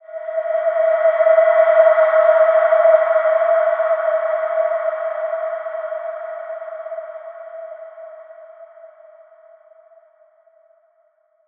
Large Space E5 f, healing soundscapes

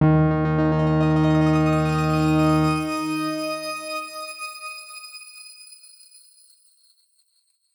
Grain D#2 ff; healing soundscapes; HSS Ableton Pack1; X Grain

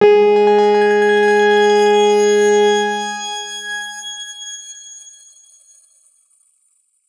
X Grain G#3 ff; healing soundscapes; Ableton

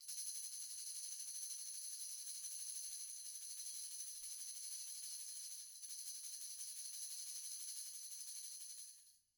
Tamb Roll, Percussion

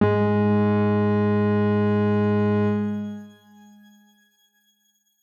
X Grain G#2 pp; healing soundscapes; HSS Ableton Pack1

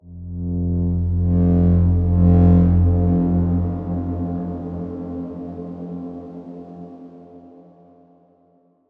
Darkswarm F1 f; HSS Ableton Pack1

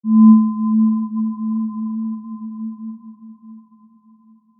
Aurora B3 p; healing soundscapes; HSS-Custom-1

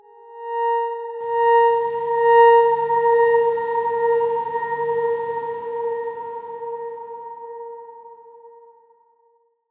Darkswarm A#4 mf; HSS Ableton Pack1

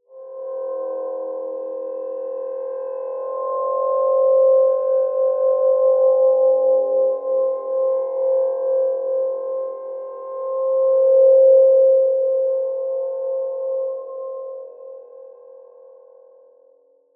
C5 pp, healing soundscapes; HSS Ableton Pack1

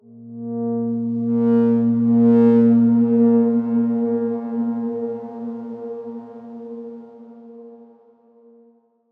Darkswarm A#2 ff; HSS Ableton Pack1

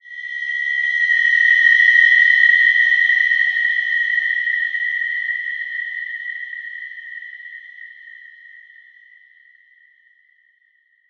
Wide Dimension B5, healing soundscapes

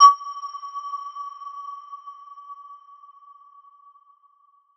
Aurora E6, healing soundscapes; HSS-Custom-1

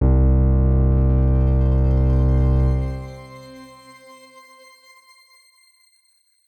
X Grain C1 mf; healing soundscapes; HSS Ableton Pack1

